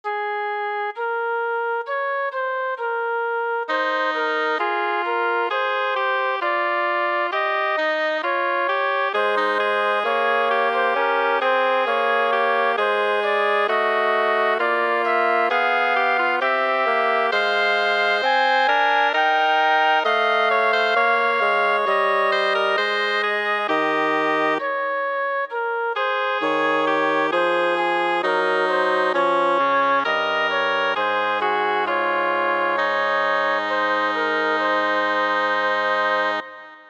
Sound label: Fuga a 3 in A-flat major; Another very short fugue I wrote after working through IJzerman's "Harmony, Counterpoint, Partime